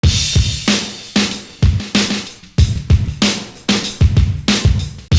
HARD N HEAVY; Univers Sons

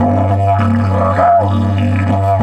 DIDGE 100BPM, Didgeridoo; Big Fish Audio - Didgeridoo and Other Primitive Instruments

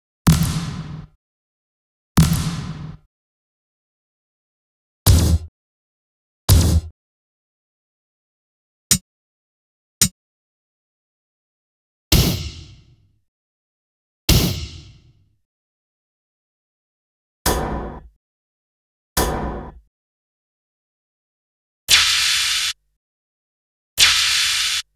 Kick, Percussion; Bizarre Planet

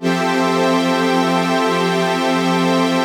PAD, Dance Mega Synth

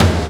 TOM, Drum Dry; East Collexion; Drum 1

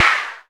snare mod

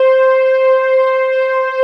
LARRYPAD; Best Service Dream Experience; LARRY 2

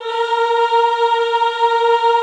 EHH A#3, Classical Choir; Best Service

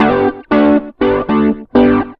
rock, acoustic guitar loops

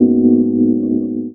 Chords Tones; Equipped Music; Loungin House

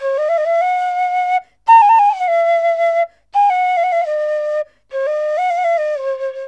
SERULING, Heart of Asia

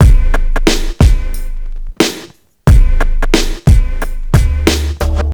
Street Tip, R&B Drums

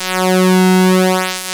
PULSE MOD

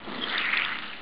wet wind; Amiga Soundtracker sample

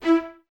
String Ensembles, Violas

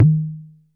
TABLA; Music Store Sound Service; Einstein

